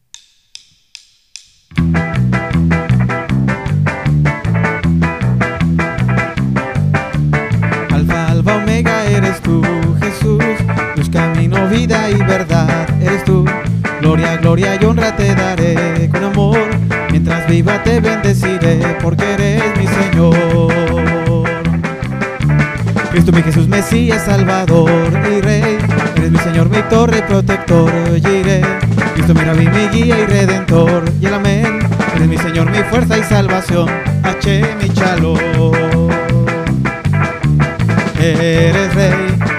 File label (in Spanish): Popurri Hebreo